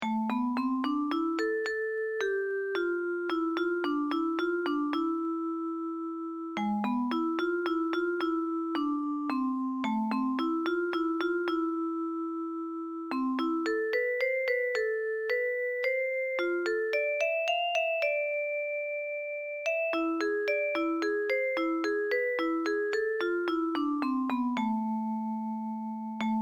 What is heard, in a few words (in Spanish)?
short melodies melodia popular